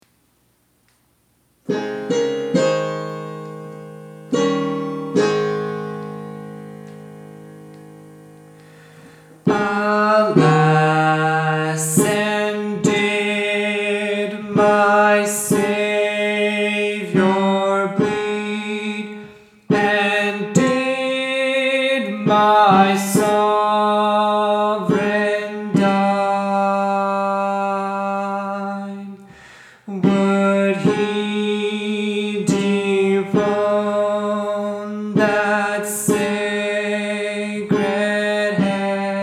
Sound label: Tenor